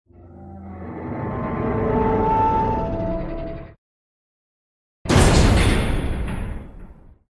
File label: Door